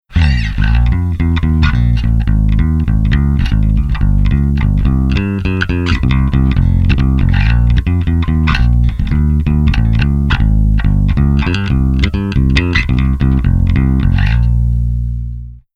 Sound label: Bass Amp Sim Ultra Hi Lo On